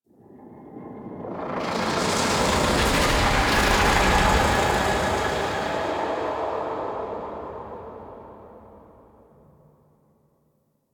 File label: Pulsating Whoosh; Ambience